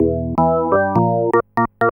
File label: ORGN LICK, K E Y S